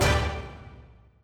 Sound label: bigwin change